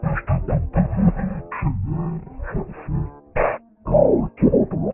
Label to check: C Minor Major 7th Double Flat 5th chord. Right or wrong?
Wrong